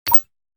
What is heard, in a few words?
Action adventure game menu ui select